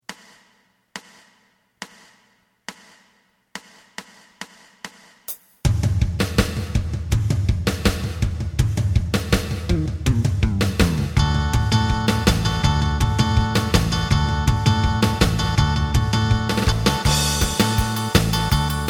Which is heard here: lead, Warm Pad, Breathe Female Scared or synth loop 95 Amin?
lead